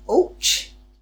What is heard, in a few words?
ouch; dollsHouse